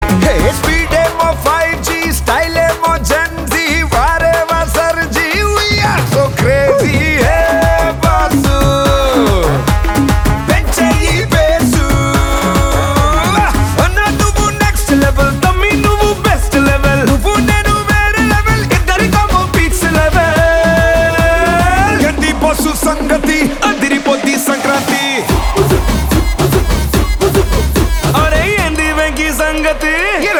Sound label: Mass Song